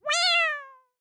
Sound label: cat meow synth like a kitten